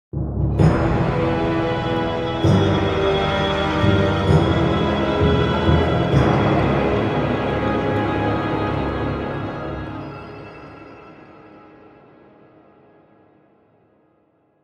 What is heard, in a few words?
Music Northern Winds smoke end